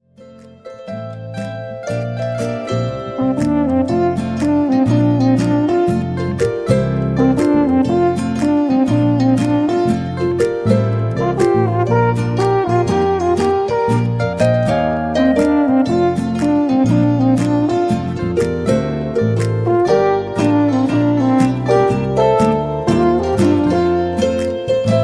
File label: Swinging Alphorn; stubenmusik